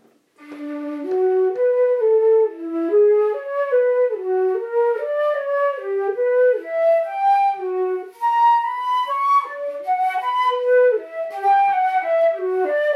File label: Fantasia, flutes